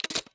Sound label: sawedoff insert shell; weapons; Counter Strike Model Pack; CS2